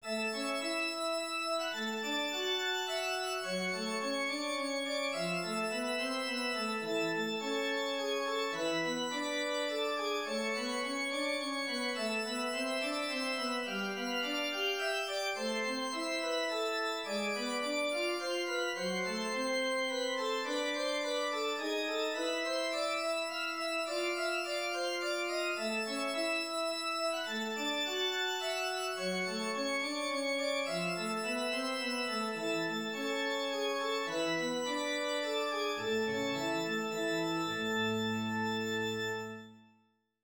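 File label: Wind Chimes, organ; manual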